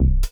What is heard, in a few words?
Lil Bass; BB 2HTC Samples Vol 4; Solos